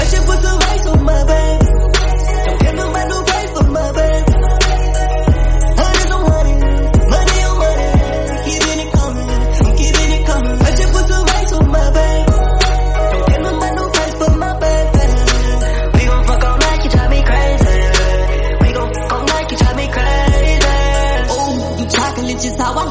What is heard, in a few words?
SPEEDUP REVERB RINGTONE